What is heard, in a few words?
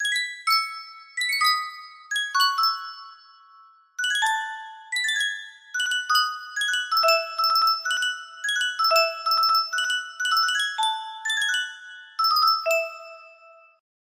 music box, music box melody